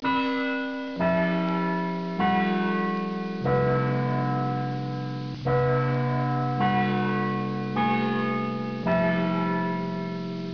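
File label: church bell; Image Bonanza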